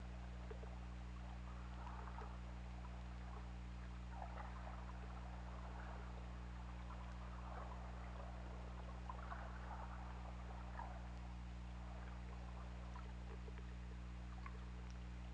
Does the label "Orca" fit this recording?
Yes